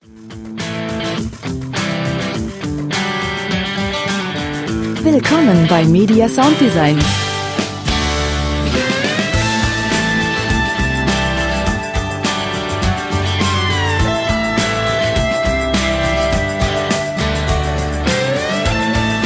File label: rock